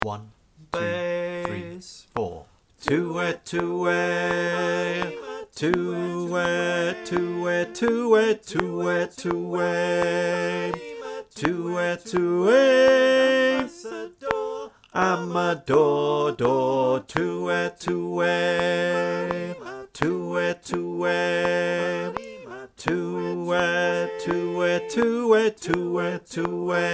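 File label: bass click, choir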